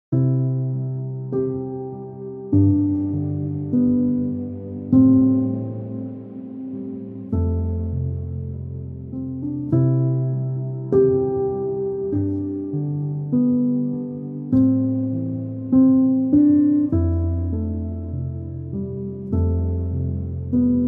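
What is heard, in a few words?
instrumental piano sad; Ghamgin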